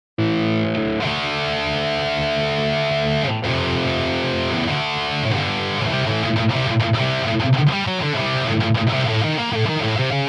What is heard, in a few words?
GIT, helix